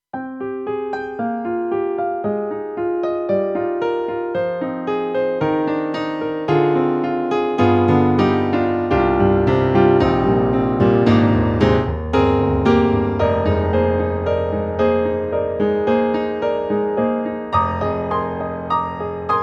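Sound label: Stereo Grand 4-Way 2, piano; KORG M3 XPanded Program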